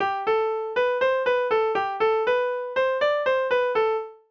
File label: Change Rhythm, jazz; First Steps